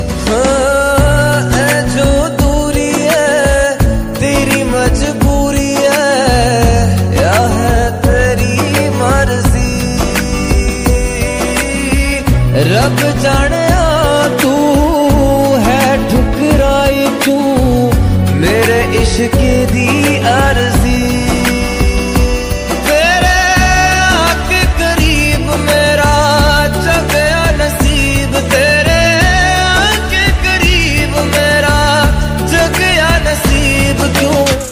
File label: Sad Punjabi Song Ringtone